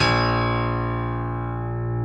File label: A#0 STR PIA, G.PIANO; AKAI S-Series CD-ROM Sound Library VOL-7